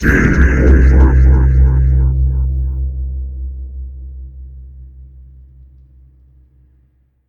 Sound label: Game Over